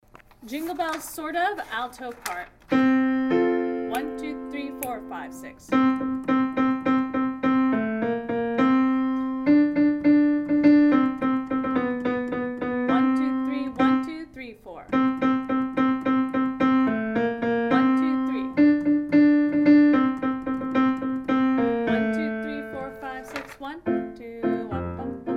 Alto1; Jingle Bells

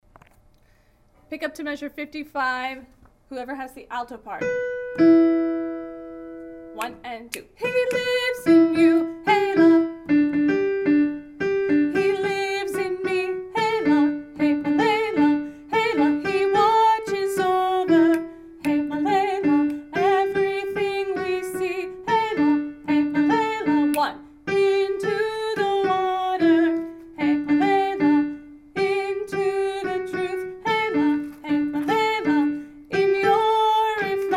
Alto

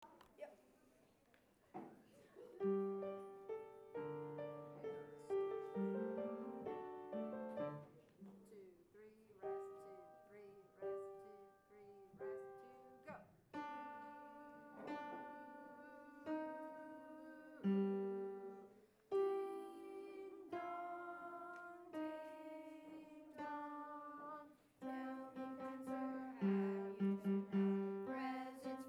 Alto; Ukrainian Carol of the Bells

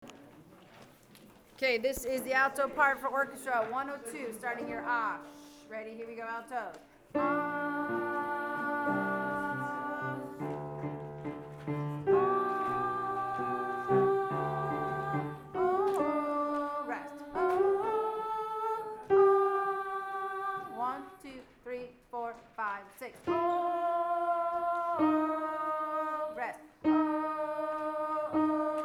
Waving Orchestra altos

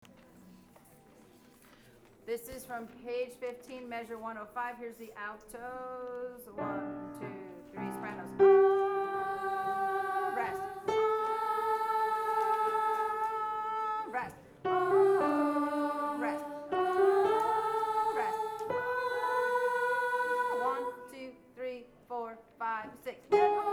Waving Orchestra sopranos

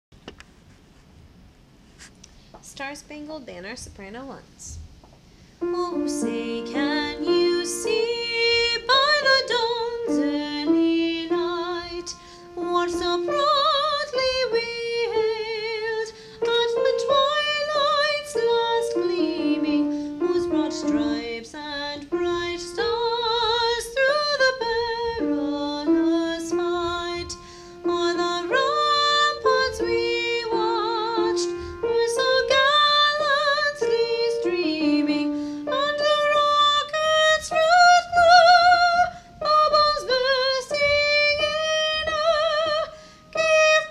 Star Spangled Banner Soprano

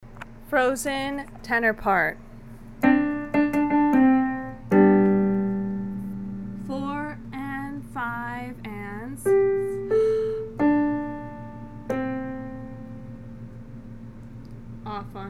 Tenor; Frozen

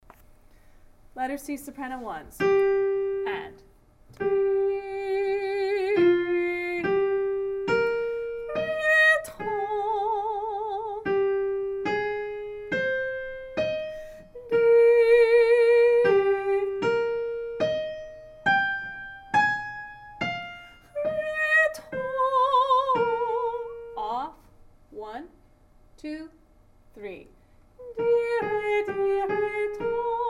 SSA Sop

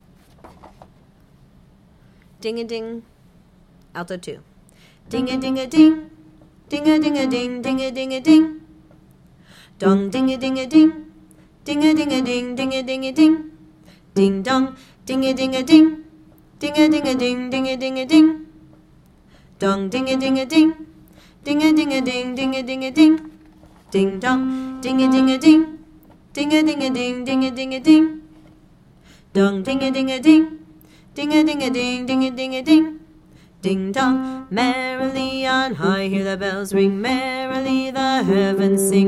Ding Alto

